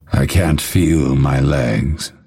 death; dota; phantom assassin

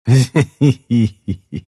pangolin laugh; dota

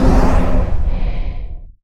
invoke; weapons; dota; invoker

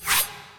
friends slide out; ui

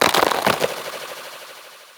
bramble layer; weapons; dota; dark willow

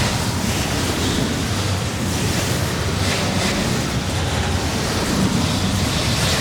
cyclone; weapons; dota; brewmaster

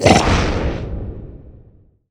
lizard blob launch; weapons; dota; snapfire

